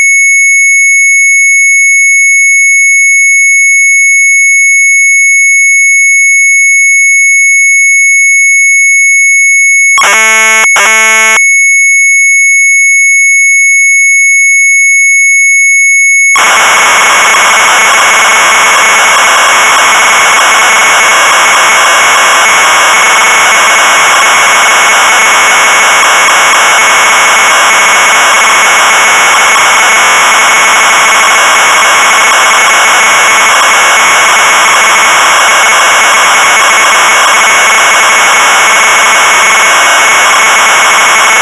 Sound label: MZ800 Tape; SCRED; sharp